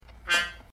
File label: trumpet